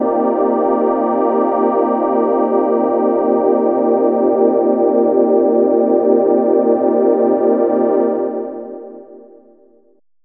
pad transcendent; Pads

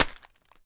Paper Bag, Found Percussion Hits; ModeAudio Found Percussion Hits

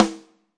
real live drum kit; REAL LIVE KITS; A Real Freakin Drum Kit

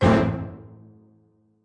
orchestra hit melody; multisampled

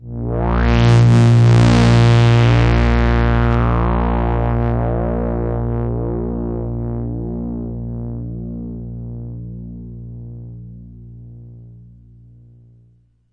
A sharp1, Sweep Synth

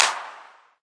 CP, Claps; drums; single hits

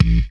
kick

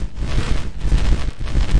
energy disruption loop; LOOPING AMBIENCE

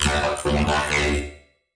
cal i for ni a, vocal or voxy loops

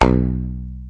Electro FX; Ueb HE Electro FX

